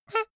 hoot soft short

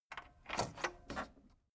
tape deck open

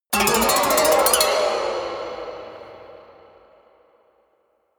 haunted strings weird stroke ricochet

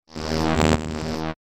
future buzzing elements layer

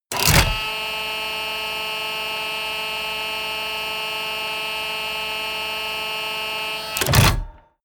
tank small turret rotate

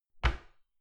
cupboard close dishes ringing soft

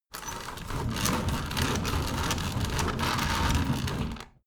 window roller shutter open fast

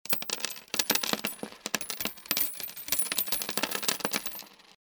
money noise

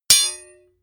hammer hit metal hard

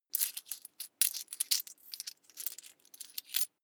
coins search

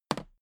toolbox handle bounce regular

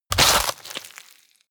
tear bone and flesh short

